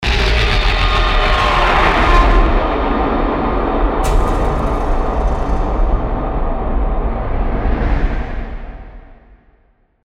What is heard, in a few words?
Atonal Nightmares; Gravity 2 Menu XL Combo Menus